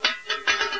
metal; aomdc

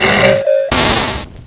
gonome pain; gonome hev

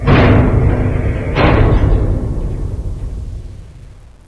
groan; caged

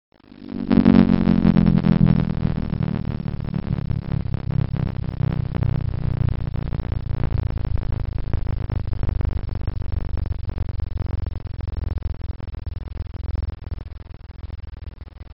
interference

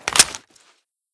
9mm clip; items

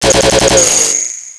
mp5 scifi; weapons

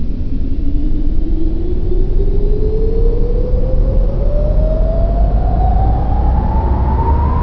mech whine startup; ambience

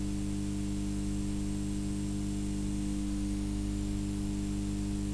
ambience; caged

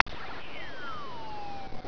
nvg off; items

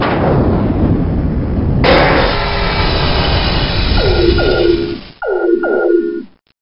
shutdown; sven

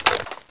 xbow mag ready, weapons

